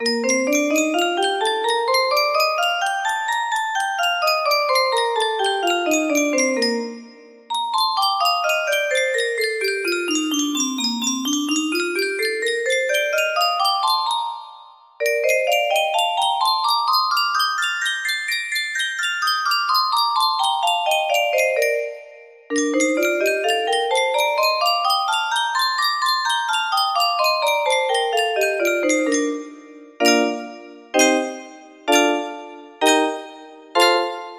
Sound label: b flat major, music box